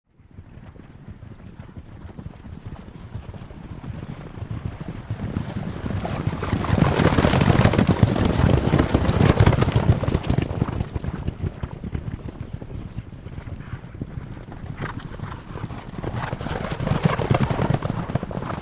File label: Horse Carriers passing by